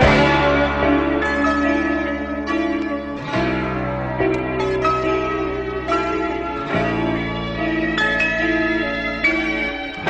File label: guitar, Loops, Sad